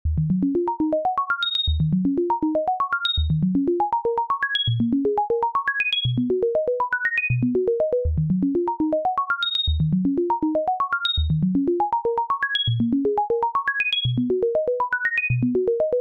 sine pluck dry; soliton; chiral audio

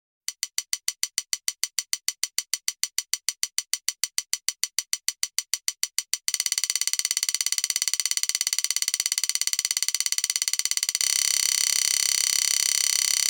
sonar

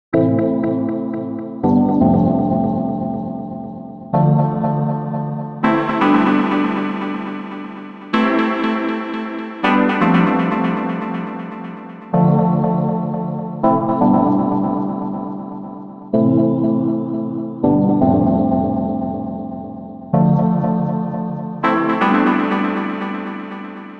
classic ping pong, delay

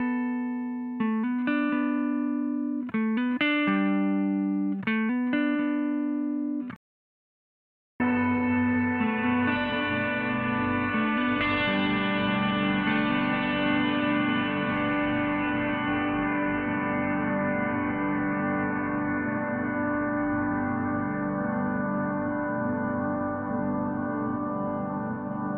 longest echo; aava; viiri audio

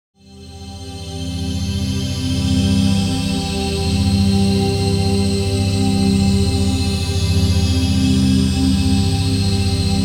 nuclear wint; porphyra hybrid by ocean swift synthesis

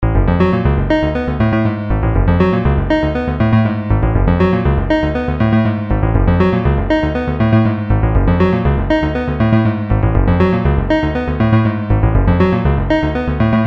video game

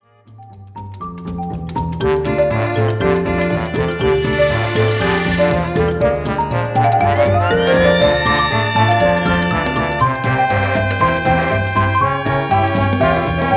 vintage xylophone; versilian studios

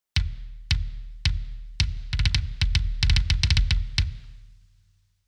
kicks; pearl m p l; klangmacht

